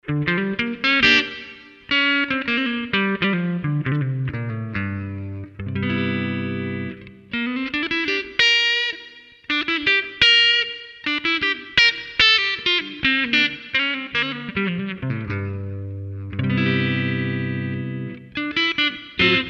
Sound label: blues on HOAG LB